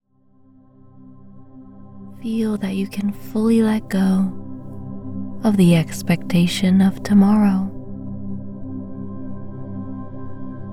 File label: Relaxing Sleep